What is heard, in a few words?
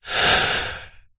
human male middle aged single breath